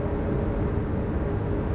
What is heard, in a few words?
ambi; Mafie 1